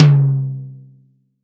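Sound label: Acoustic Tom; Roland SPD-S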